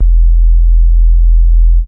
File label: sustained deep low, Jungle Bass